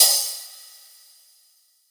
Ride; neuro; InsideInfo; Drums

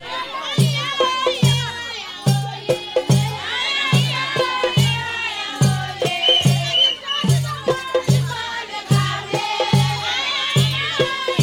KANKURAN DANCE; Best Service; World Colours

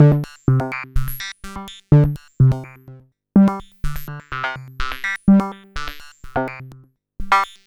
synth 125 rs&h C D G; Transmission-X

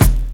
BD, Dance Mega Drums, HIP

